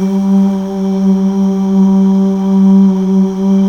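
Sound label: Male Uuh; Best Service Gigapack 1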